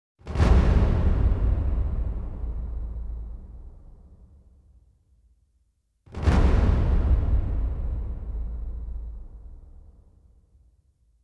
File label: percussion only